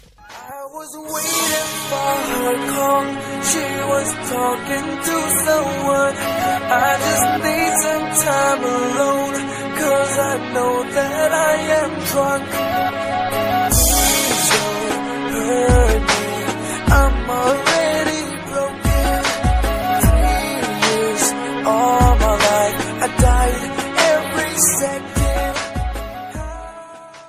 love music english ringtone

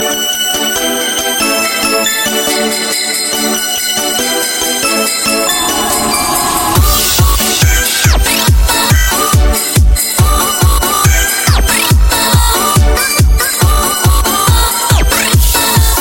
jingle mix ringtone

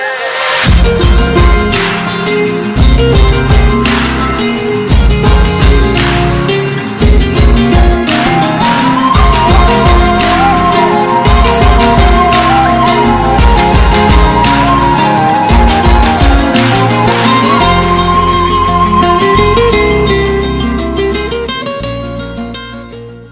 bollywood music ringtone

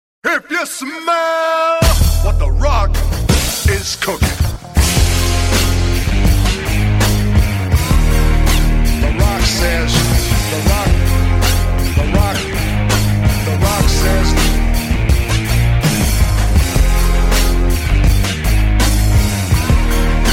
rock theme ringtone

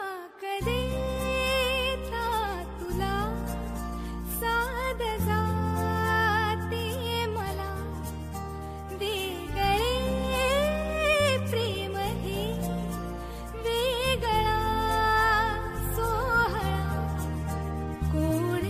female, ringtone; bollywood

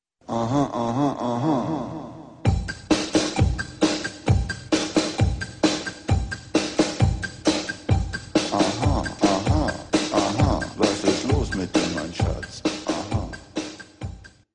ahan ahan funny musical tone ringtone

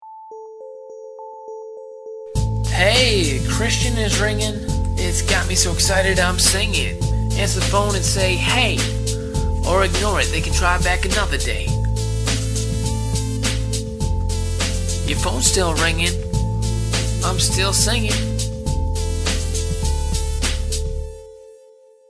christian is calling rap ringtone

